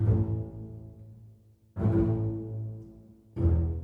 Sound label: Bells Basses